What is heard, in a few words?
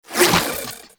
Whip Spin Whoosh; Warframe; The New War; Archon; Nira